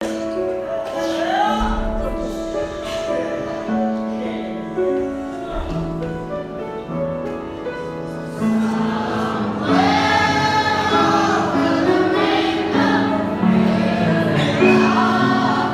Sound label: school singing assembly